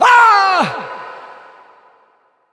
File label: Wound; Bolvar Fordragon